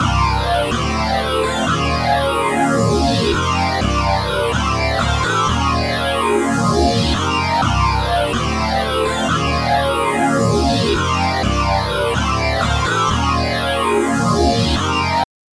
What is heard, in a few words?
The untreated synth loop.; synth/string sample; The synth sample we'll be treating.; Logic; sustained sounds, such as strings, pads and vocal effects